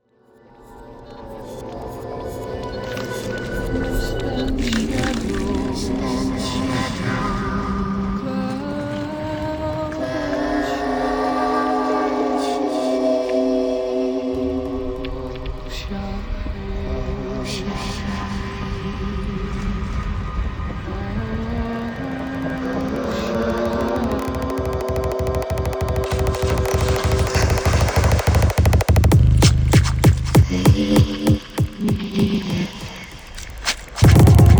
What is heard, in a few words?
cauldron; wizards